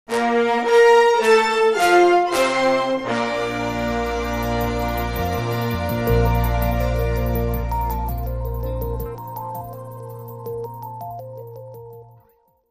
Coming Up, Brass Phrase

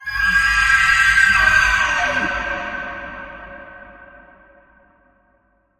Wailing Banshee Bend

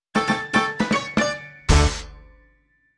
Dixie Short Sting

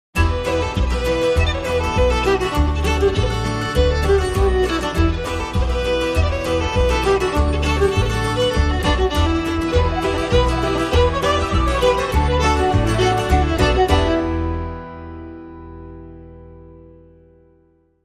Fiddle and Bow, Sting

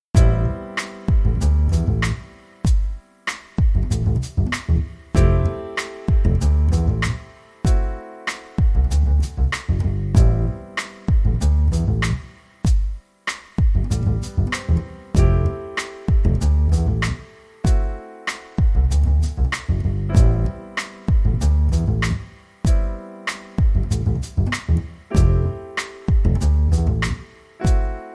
Acoustic Funk No Melody

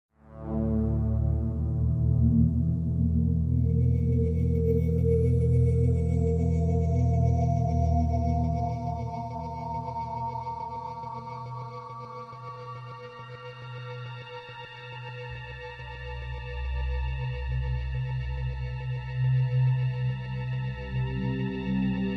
Ominous Footsteps

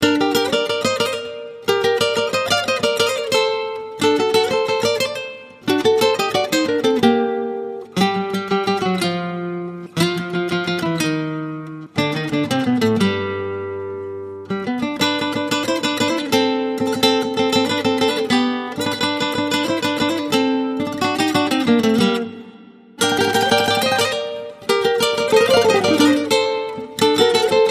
Guitar Only

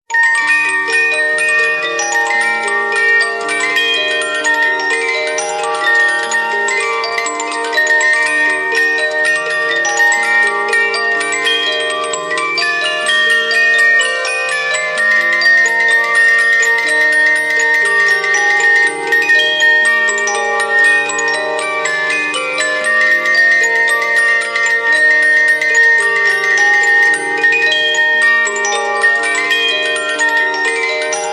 Music Box Tale

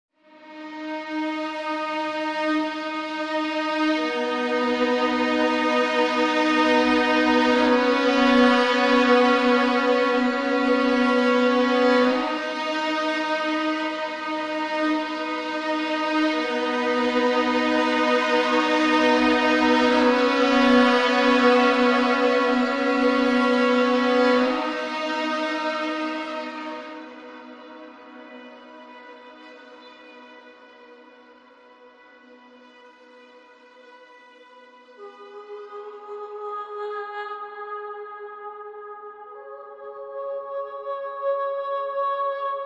String Gestures and Voices Only

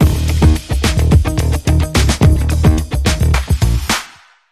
Swing

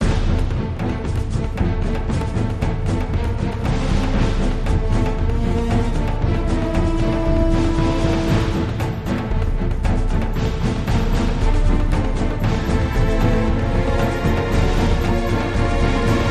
Advancing Drums

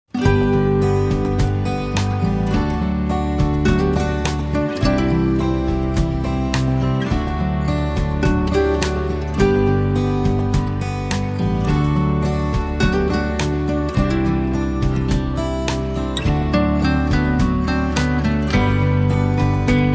Strumming Perfection

